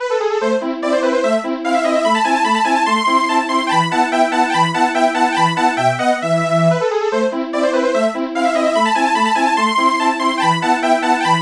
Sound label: polysynth